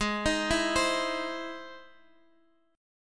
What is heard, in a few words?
E Augmented Major 7th